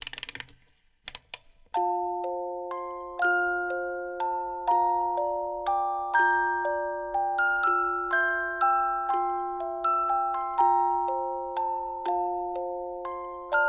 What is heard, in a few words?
Music Box, ringtones